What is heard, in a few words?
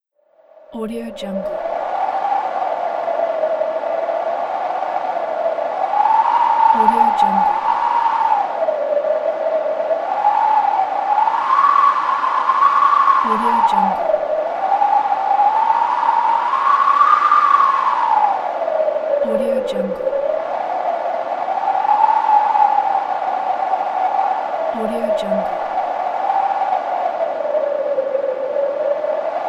wind